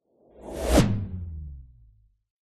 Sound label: magic spell whoosh powerful energy pass electric; fantasy